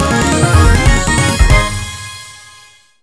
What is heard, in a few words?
RESULT CLASS UP; maimai